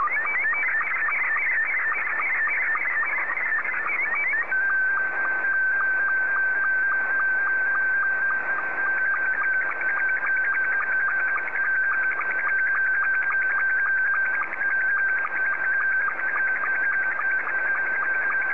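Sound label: SSTV, Scottie 2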